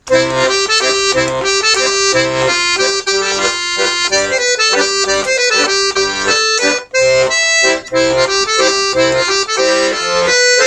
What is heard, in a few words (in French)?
valse diato